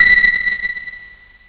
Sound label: sonar, zaps and beeps